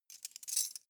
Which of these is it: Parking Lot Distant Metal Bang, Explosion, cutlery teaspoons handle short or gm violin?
cutlery teaspoons handle short